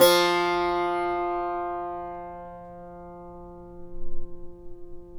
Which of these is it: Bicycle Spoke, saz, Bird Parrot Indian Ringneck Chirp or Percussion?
saz